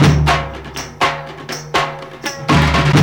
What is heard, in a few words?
DHOLE; AMG - Now CD-ROM (Roland)